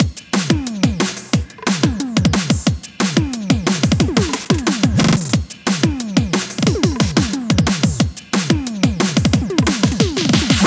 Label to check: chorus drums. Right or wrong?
Right